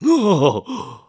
Wake Up; zelda

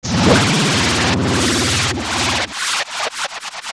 Die; Morpha; zelda; OOT

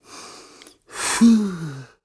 Sneak Sigh; Ghirahim sneaking up on Link; Skyward Sword

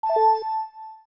Main Menu Cancel; Cancel on the main menu

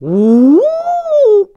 Oooh; Ravio: "Oooh...!" (upset or pleased)

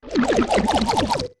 Chuchu Form; Chuchu forming

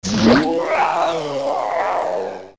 Die; Phantom Ganon; zelda; OOT

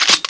Castle Guard Spear; Castle guard pointing a spear

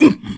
Hit; Ganondorf

